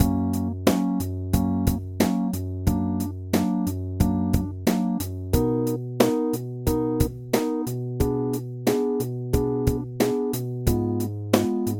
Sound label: chord